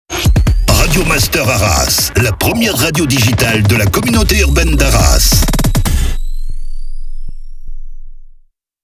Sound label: Sweeper SPEED; Radio Master Arras; Habillage